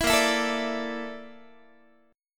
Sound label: Bb Major 7th Flat 5th Bb Guitar; strummed